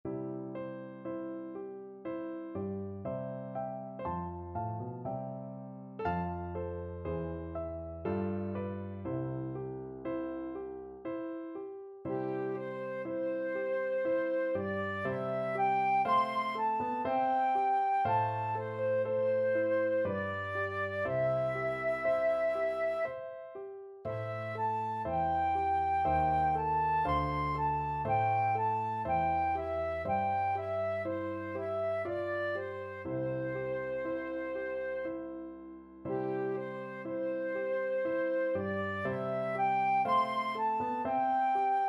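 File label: 2 flute karaoke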